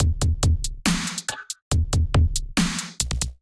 Kit groove 140 bpm; MIDI Clips; Luminous Kit groove; Ableton; Factory Packs; Drum Essentials

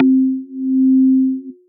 Reso Organish, percussive; Instruments; Ableton; Synth Essentials; Operator

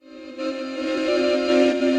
Voices; Humble Pied Piper

